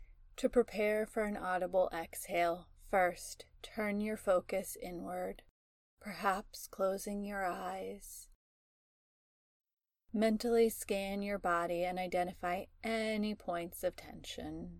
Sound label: Audible Exhale